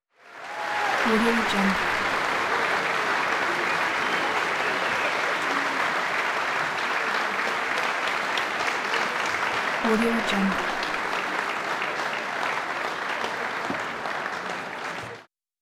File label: big crowd applause